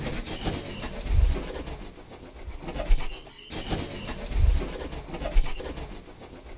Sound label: flying; fowl-play; timmy; enemies